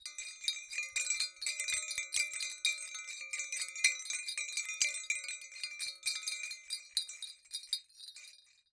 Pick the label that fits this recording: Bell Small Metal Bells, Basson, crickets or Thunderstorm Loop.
Bell Small Metal Bells